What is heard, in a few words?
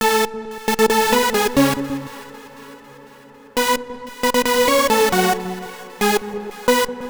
135 Det Lead2; Zero-G Trance Inducer; 90 s Sample CDs